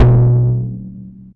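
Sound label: TOM loud; Zero-G; Chemical Beats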